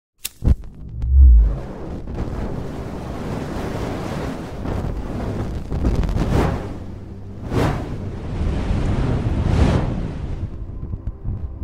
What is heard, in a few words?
fire movement